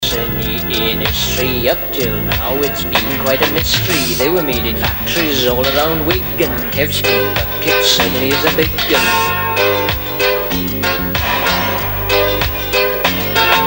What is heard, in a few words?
Pink Bucket Reggae